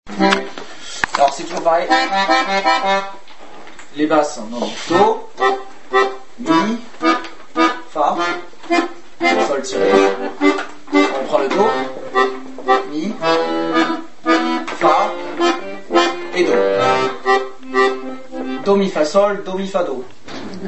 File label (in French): Mazurka arpeges; Mazurka d-Auvergne